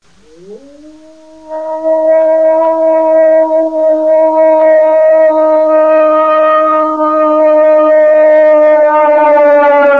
LUPI

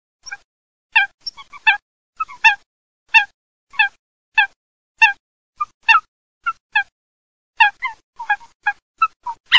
FOLAGA

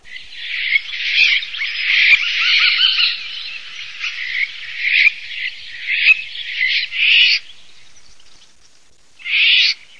rigogolo

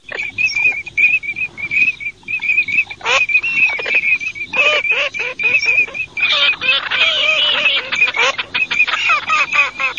ALZAVOLE MIX

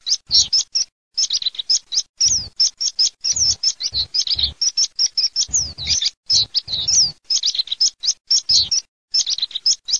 PISPOLE MIGLIARINO

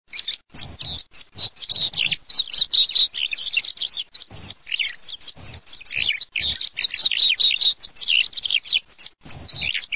MIGLIARINO PISPOLA LLODOLA, birdsound

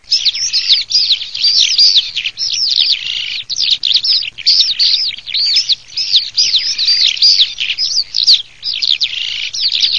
allodole, birdsound

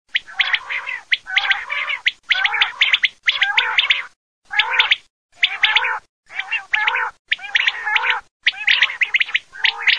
QUAGLIA

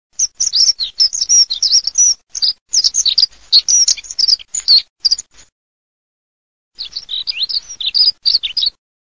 PASSERA SCOPAIOLA

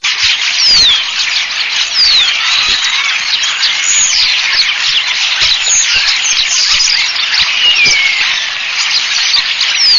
storni

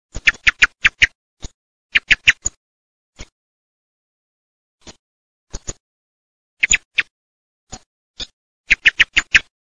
tordo, birdsound

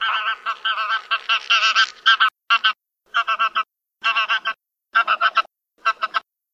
Oca selvatica

